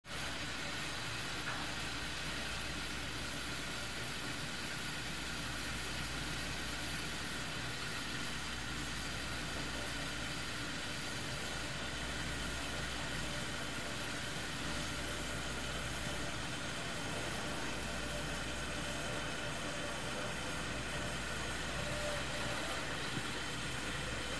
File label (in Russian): svistok chajnika